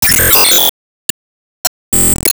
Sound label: Enemy Evolution Active Attack